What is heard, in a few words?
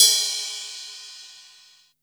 RIDE JAZZ